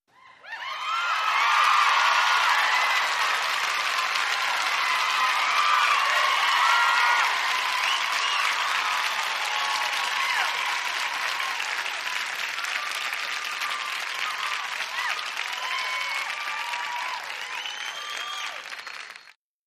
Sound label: Football Crowd Huge; sports arena football